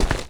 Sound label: asphalt walk